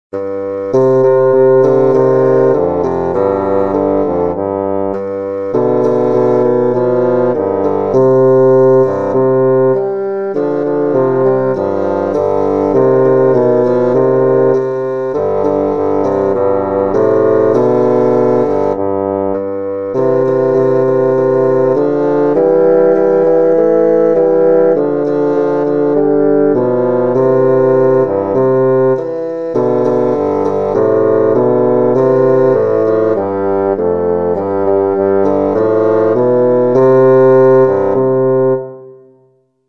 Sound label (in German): Fagott Tango